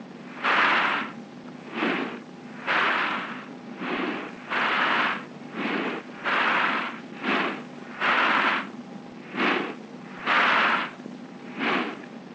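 sawing of a large object, maybe a steam engine puffing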